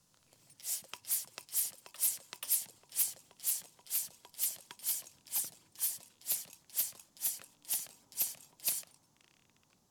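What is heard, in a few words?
bicycle pump fast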